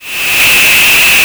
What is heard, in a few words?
airport